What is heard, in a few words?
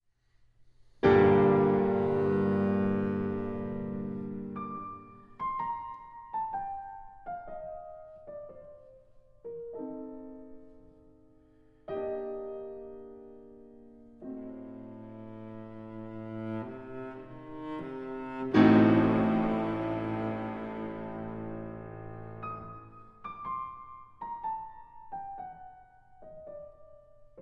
Sonata in G Minor, Adagio Sostenuto e Espressivo - Allegro Molto; Op. 5 No. 2